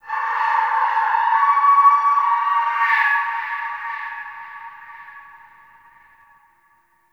Forest Distant Bird Of Prey, Ambience; Warframe; Grineer

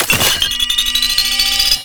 Heavy Orbital Strike Cast Charge Up; Abilities; Warframe; Tau; Dax Heavy

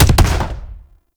Grenade Launcher Punch; Weapons; Scaldra

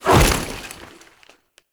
foley melee sweep; Warframe; Characters; Kahl

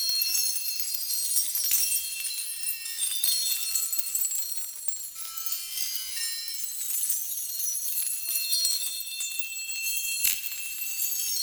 Investigate Victim Loop; Warframe; Cephalon Weave

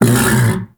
Undazoa Vocal Tranq Grunt; Warframe; Hunting Critters; Characters

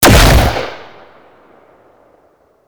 Shot Single, Pistol; Amphis; Warframe; Grineer

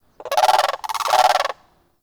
Forest Bird Talk; Ambience; Warframe; Grineer

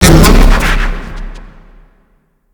Buff Burst; Syndicates; Red Veil

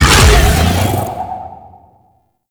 Howl Attack; Warframe; Voruna

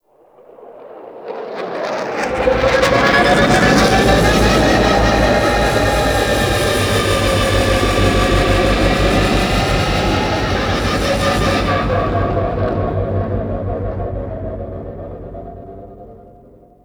Relay Intro Docking; Vehicles; Flight; Warframe; Liset; Nora Liset

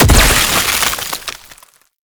Freeze Stack; Warframe; Nineteeen Ninety Nine; Deep Archimedia

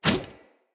Hunter Bow Melee Whoosh; Weapons; Player; Bows; EnvoyHunterBowRangedMelee

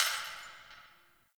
Distant Glass Breaking

